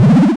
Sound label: goal; BoostBaller